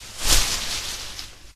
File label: shrubbery